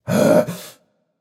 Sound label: breath; smite; tsukuyomi